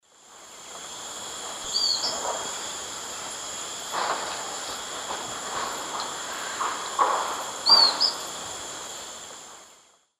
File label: Terenotriccus erythrurus, call or song; S. America; Eastern Lowlands